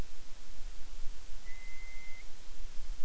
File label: hollow whistle, night parrot